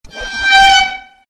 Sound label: violin screech fast